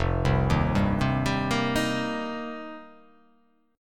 F 7th Suspended 2nd Suspended 4th

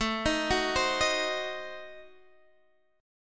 A Diminished 7th, Guitar Half Step Down

